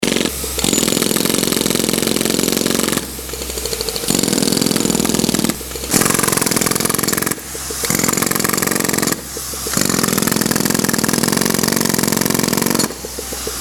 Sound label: jackhammer